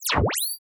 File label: UI, frequency shaping, zippy, robotic, evolving, dense, deaction; Start Select